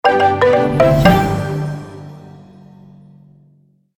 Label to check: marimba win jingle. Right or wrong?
Right